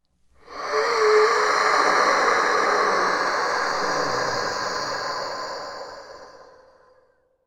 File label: die; svencoop; legends